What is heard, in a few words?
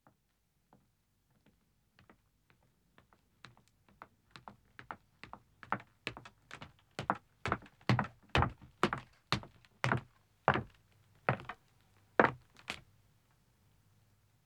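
Wooden Stairs Descending, 1 Man, Footsteps; BBC Sound Effects Library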